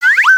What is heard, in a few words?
Pet Come Here; creatures pets